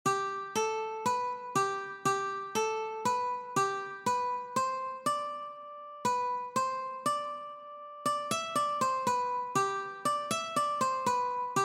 Brother John Ukulele

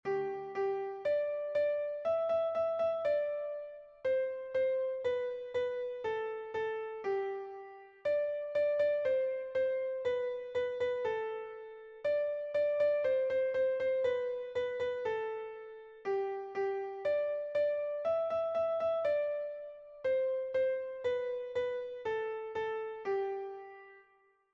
Baa baa black sheep Piano

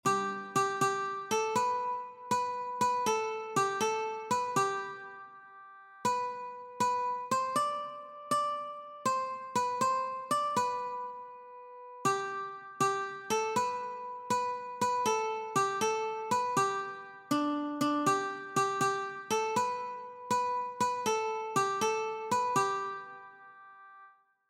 Itsy Bitsy Spider Ukulele

